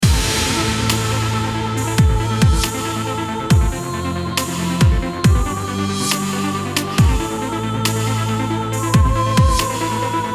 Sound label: fashion vlog music; blogging for fashion